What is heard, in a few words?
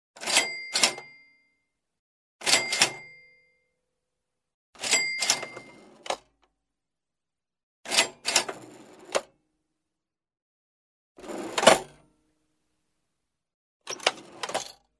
Old cash register; money